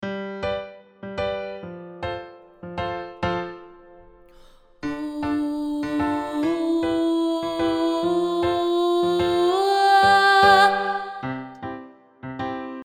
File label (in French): voix